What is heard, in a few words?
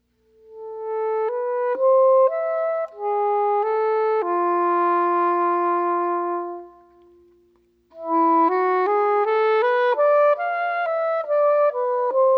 flute